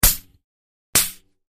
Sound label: Bicycle Tire Short Ai